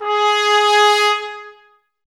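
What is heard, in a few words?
BRS trumpets G#; Miroslav Vitous; Woodwind Brass Ensemble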